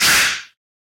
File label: snake attack